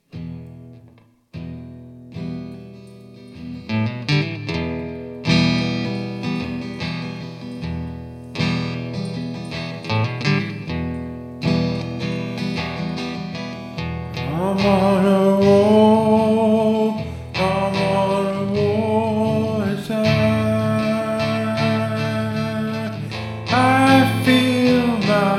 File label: Jam